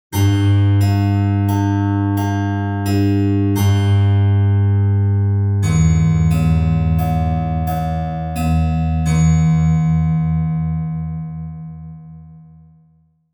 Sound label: High And Low Pluck Series; Plucking a High and a Low pitched string; simulations; nemus numerical sound restoration